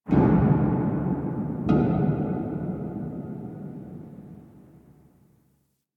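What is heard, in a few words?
Ocean Distant Bang; Warframe; Grineer Ocean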